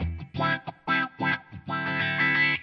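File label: mellow guitar wahwah funk, 091 bpm; GUITAR LOOPS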